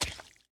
shallow water, walking; factorio 2.0.55

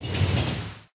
train tie; factorio 2.0.55

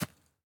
grass, walking; factorio 2.0.55

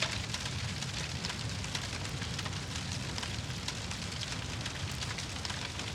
fire; factorio 2.0.55

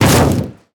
car metal impact; factorio 2.0.55

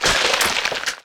behemoth gore; factorio 2.0.55

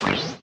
energy shield inventory pickup; factorio 2.0.55

